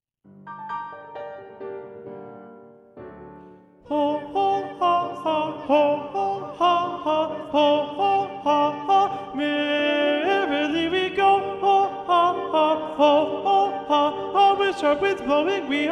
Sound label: ssa alto predominant; a la russe